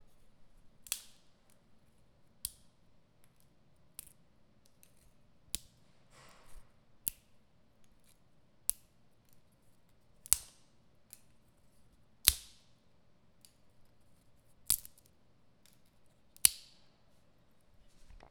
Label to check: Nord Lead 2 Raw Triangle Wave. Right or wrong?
Wrong